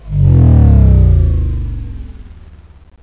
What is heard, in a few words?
Shutdown; Semitour Perigord; Lascaux II